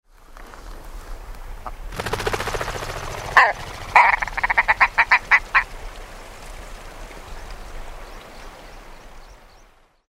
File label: Willow Ptarmigan Lagopus lagopus scotica